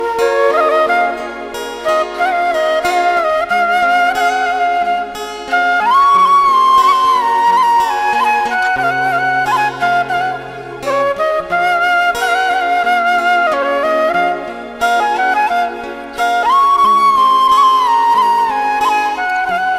Flute Ringtone

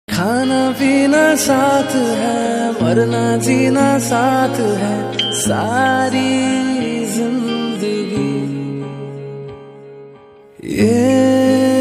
Best Friendship Ringtone

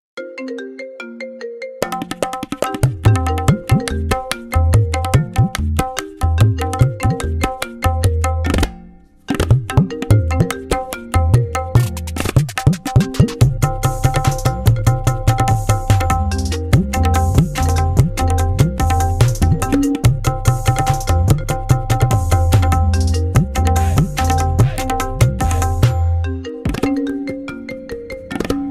New Rabla Mix iPhone Ringtone; ringtones

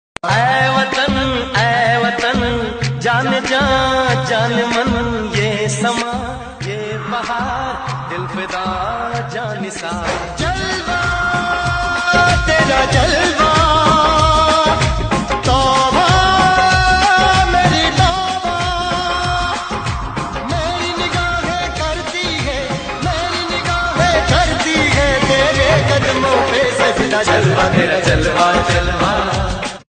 Desh Bhakti Ringtone

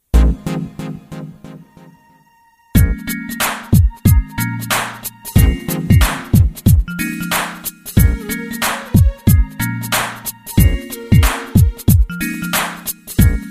Alarm Deep Groove Ringtone; ringtones

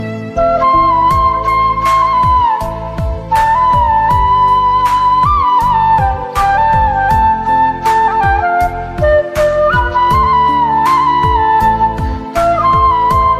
Romantic Flute Hindi Song Ringtone; ringtones

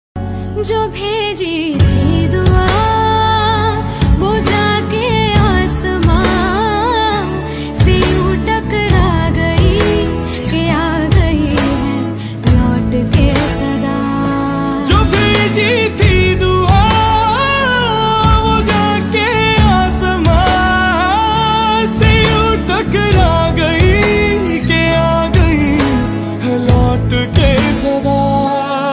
Song Ringtone Female; ringtones

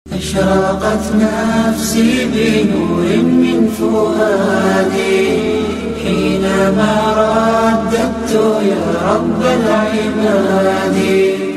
Arabic Islamic Ringtone; ringtones

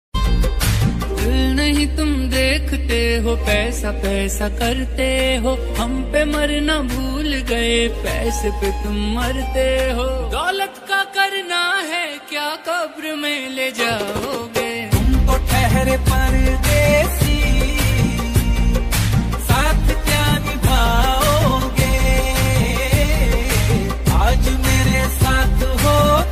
Odia Song Ringtone

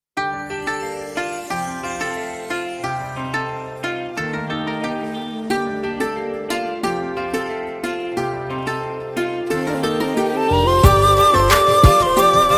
Instrumental Ringtone

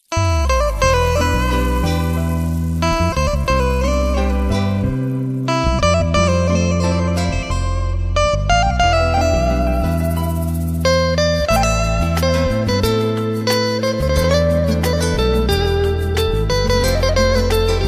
Instrumental Guitar Ringtone

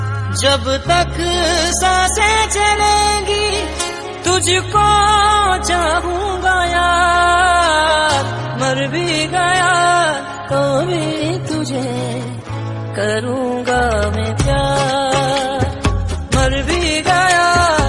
Love Song Ringtone

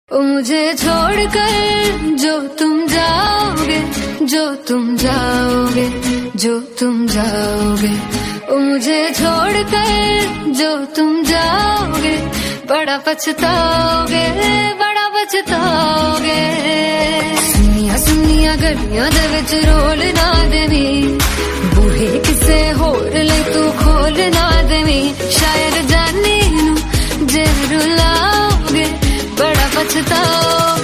Female Version Ringtone

